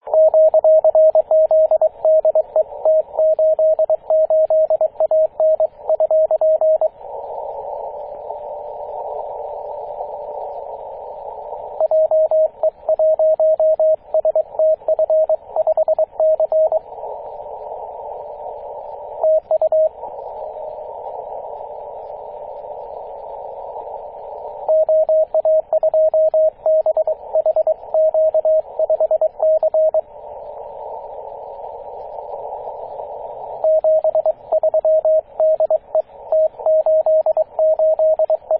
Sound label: 14.024 MHz cw